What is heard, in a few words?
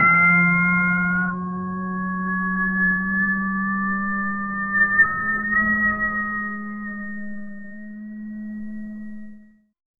funny cartoon fail sound